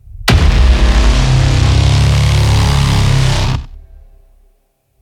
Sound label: Hit; Monitor